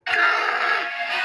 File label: goofy aah gasp; red birds